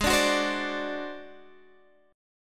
G sharp 7th Flat 5th G sharp down Guitar Standard, chord; G#7b5; strummed; JGuitar